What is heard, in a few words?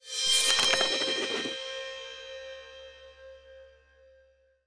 achievement; loyalty and blood viktor origins